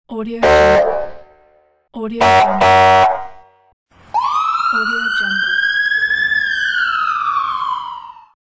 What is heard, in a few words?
police alarm